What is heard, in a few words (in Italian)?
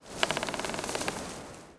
Albero, natura